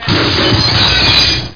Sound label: RAMBAMM; amigaformatcd29; screenplay; crossfire